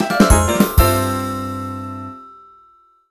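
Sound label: jingle dialog quest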